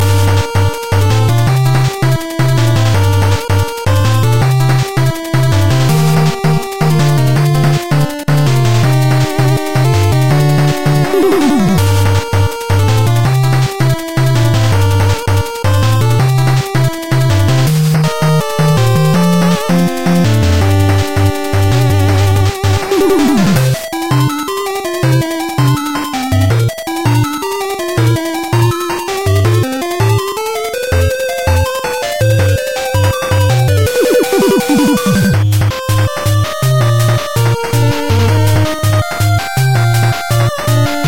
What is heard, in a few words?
burst; rockman7